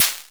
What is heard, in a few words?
Univox Micro-Rhythmer 12, Drums